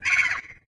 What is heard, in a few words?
bird screech